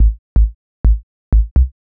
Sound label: HOUSE BASS 125 A